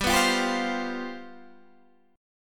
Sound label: G 11th, Guitar, Standard; G11 strummed